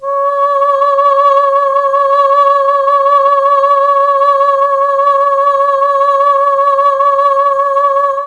C#3 FEMALE, Mellotron; CHMB FEMALE; Mike Pinder - Mellotron Archive CD

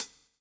COPS STK; Spectrasonics - Backbeat

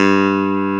Clavinet G2; USB Soundscan vol.09; AKAI; Keyboards Old School; CLAVINET4

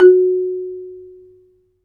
MARIMBA; ILIO

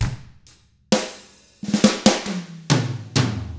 SLOW ROCK, Drums; ILIO; Double Platinum Drums 1

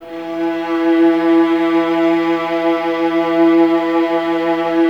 VLS E 3, Violas; Miroslav Vitous; VLS 1+2

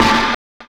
Snare, Drum and Bass; EZ Rollers; Instrument Samples

